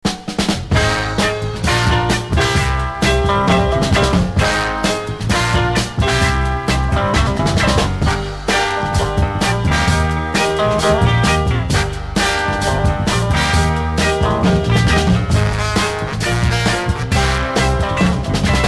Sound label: Instrumental